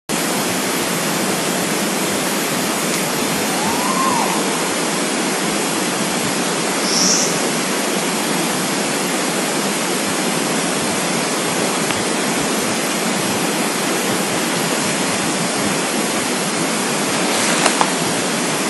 Strix huhula; Portal de Piedra; Jorge La Grotteria

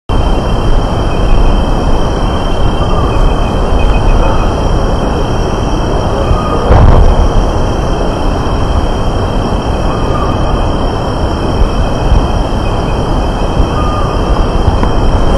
Micrastur semitorquatus; PP Esmeralda; Jorge La Grotteria

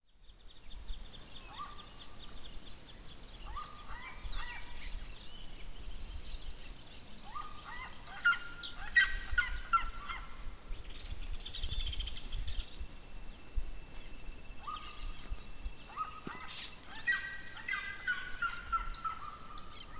Cariama cristata, Seriema

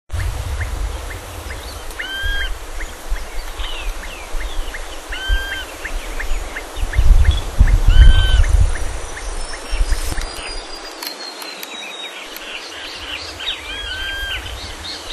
Myiarchus swansoni; Carlos Pellegrini

